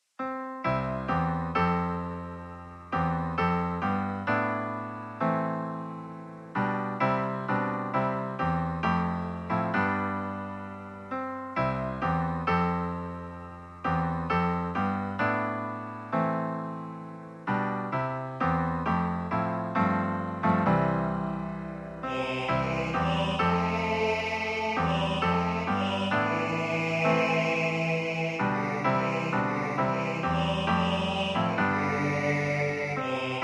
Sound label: I Heard The Bells Soprano